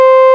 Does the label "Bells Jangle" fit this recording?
No